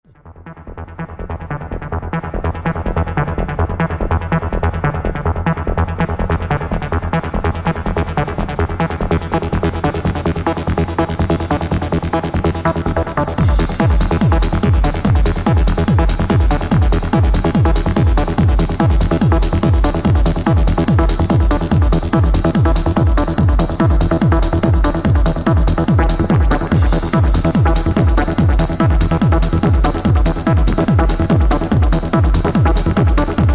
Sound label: korg 707 sounds, external fx